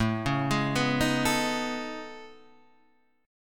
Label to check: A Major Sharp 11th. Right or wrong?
Right